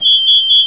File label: warn